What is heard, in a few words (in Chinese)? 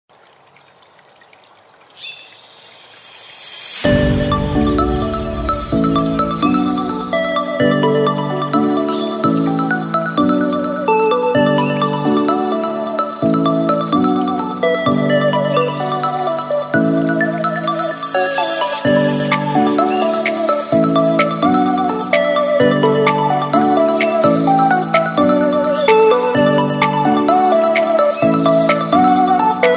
纯音乐版